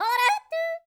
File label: YODEL, World Voices; ILIO; Vocal Planet